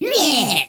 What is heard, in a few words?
SAND CHIMAERA